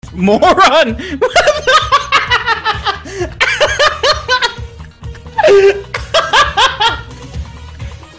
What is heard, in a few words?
moron laugh